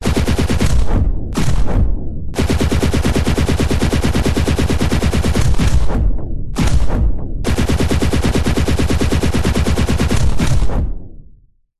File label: science fiction weapon automatic gun fire bullets whoosh battle; zapsplat